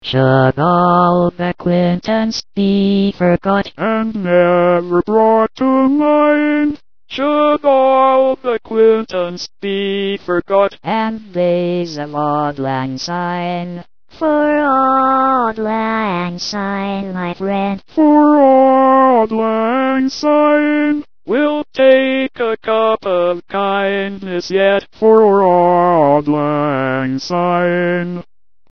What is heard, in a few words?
auld lang sine